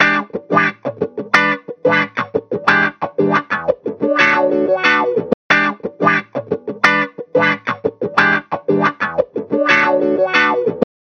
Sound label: Stereo Flanger, Psychedelic SynchroPulse, Guitar, Dry; Sonicircuit; Syntheway